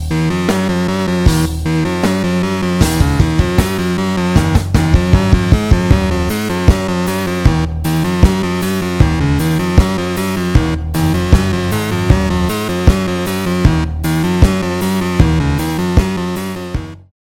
lead shivering impulse

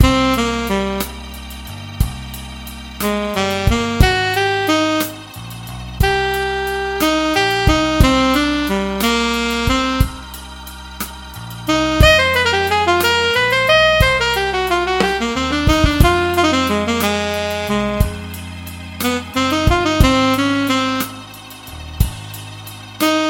alto saxophone

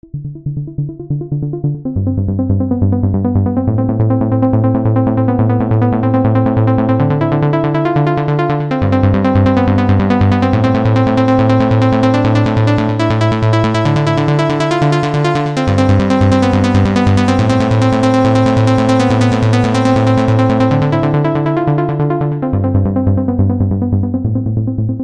sawpluckz

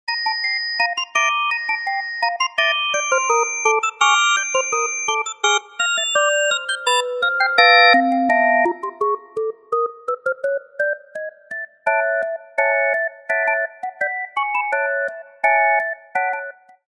glassy drawbars

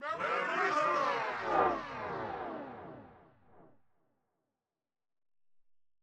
merry horror vox

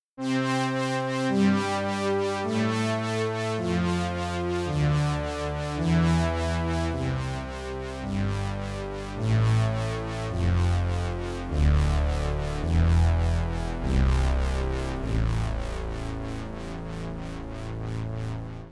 saturnish wet chorus

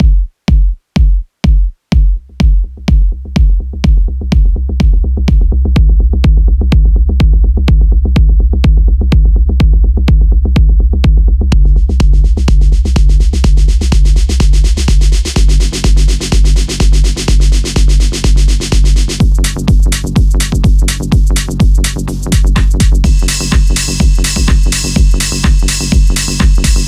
big kick; Jamie Anderson

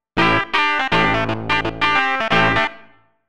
Prophet factory clav; opx pro3

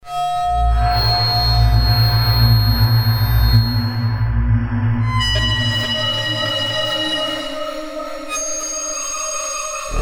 playing in the metal shop

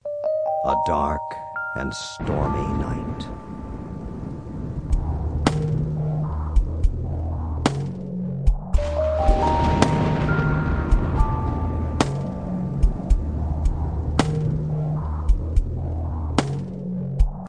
dark delay dry

